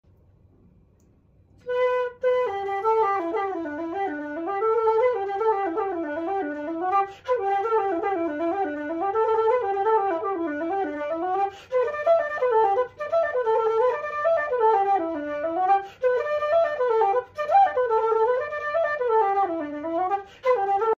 Irish flute; فلوت ایرلندی

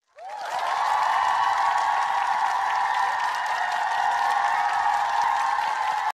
Birthday commotion; هیاهوی تولد

